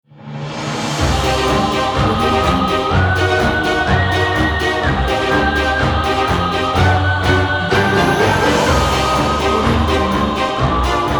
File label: halloween